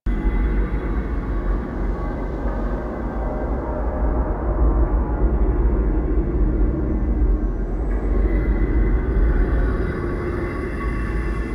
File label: Asteroid Pipe Tunnel Amb Loop; Warframe; Ambience; Grineer Asteroid